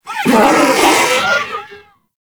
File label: Snow Critter Vocal Death; Hunting Critters